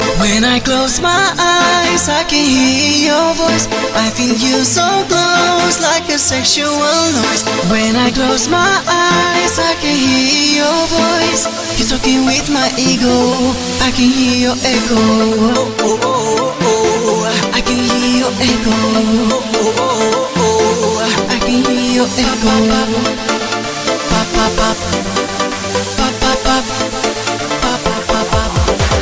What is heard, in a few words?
Echo